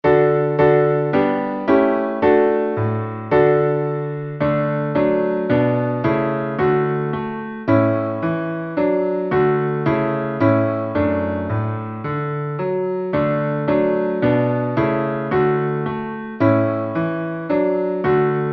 schnell Klavier

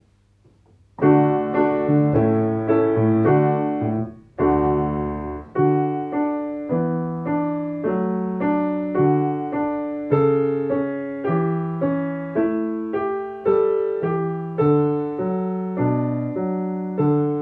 Alle drei, Klavier

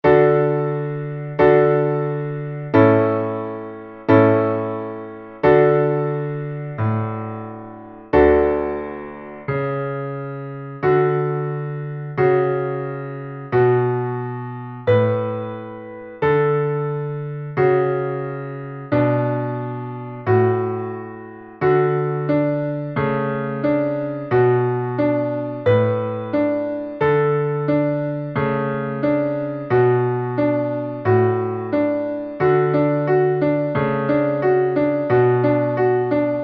Klavier

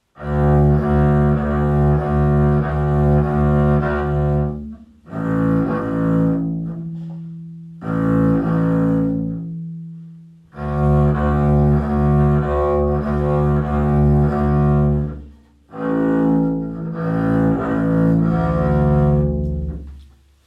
arco Bass

